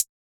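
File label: hi-hat Closed, Yamaha DJX 2B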